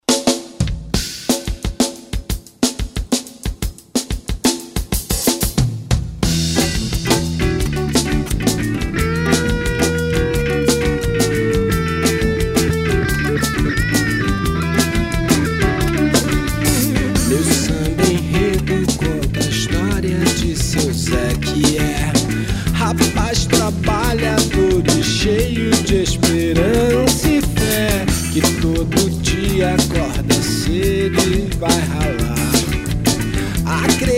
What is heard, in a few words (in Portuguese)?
samba